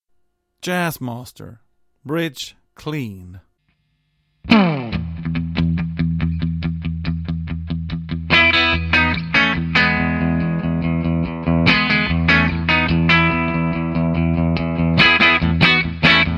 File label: Jazz master Bridge 0 dB